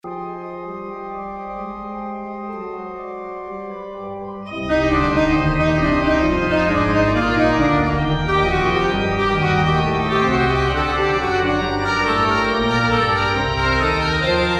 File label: Fuge g moll, orgel und sax